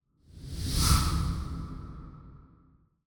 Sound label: Whoosh Swoosh Cinematic